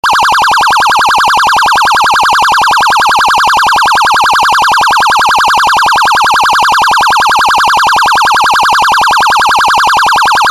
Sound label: Siren Tone PRIORITY